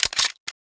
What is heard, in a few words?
9mm handgun mag out; weapons